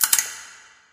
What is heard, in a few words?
light flicker; Source Engine; Portal 2